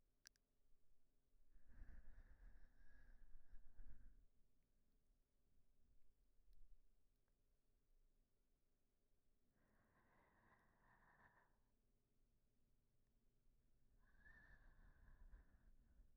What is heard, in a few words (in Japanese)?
おやすみなさい(寝息)